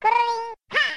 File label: yoshi ping ha